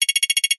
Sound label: multimedia game sound toggle